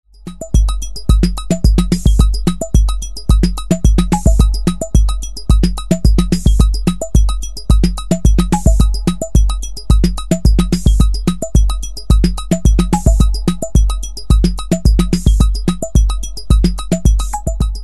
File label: 808 pro1